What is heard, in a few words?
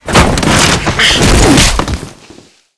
punch